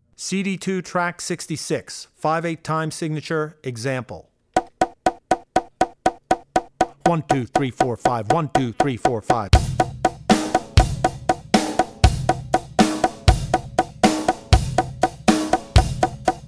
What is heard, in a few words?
Odd Time 5/8; Drummer's Bible Tracks